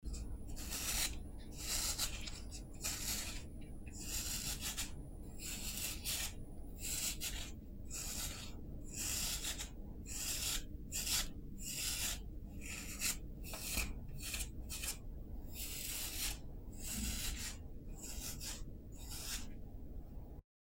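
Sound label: comb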